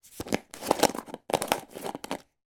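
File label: food jar gravy granules lid open; zapsplat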